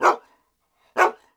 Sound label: dog bark